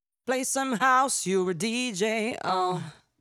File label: 123BPM F